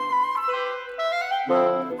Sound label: Winds, Orchestral; Sonic Foundry (Sony Creative Software); Orchestral VOL-2; Modern 19